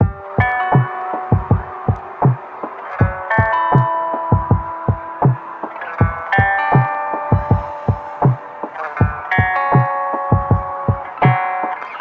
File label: Bassically Hop Loop